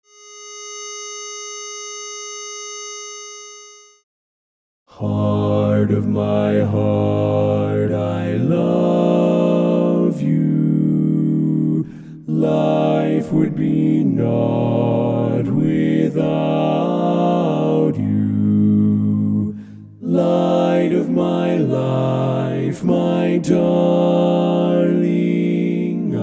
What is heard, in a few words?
Bass, Barberpole Cat; Barberpole Cat I